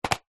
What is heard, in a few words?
box card small safety pins lid on set down; household